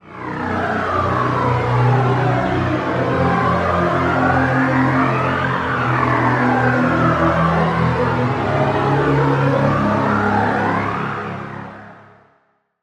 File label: Pad; Undead Field; Routed Sound; Serum 2 Expansion - Chaos Bringer Vol.1